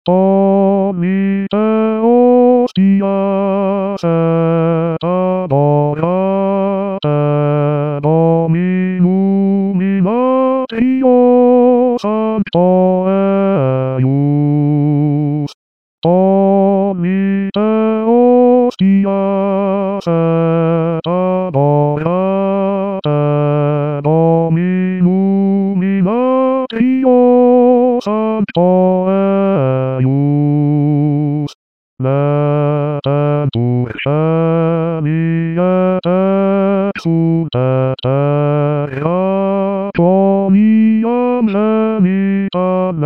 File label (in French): basse1; artificial; NOEL; tollite hostias